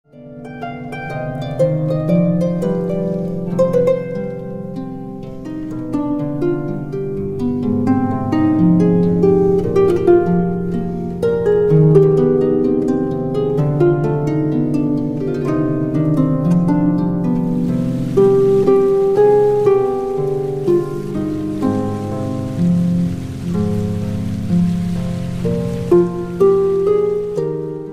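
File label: From heaven you came, harp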